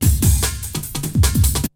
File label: BREAKBEATS, TECHNO